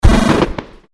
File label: Brick Break; DoughnutQuest